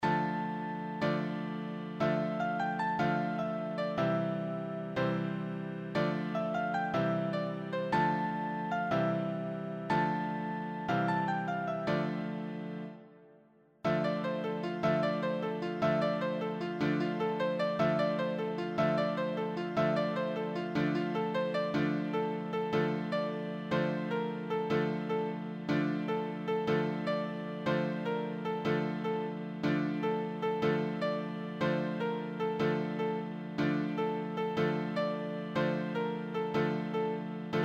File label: easy solo, piano